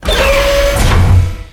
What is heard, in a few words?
Crane Machinery Atk; FlyForInsanity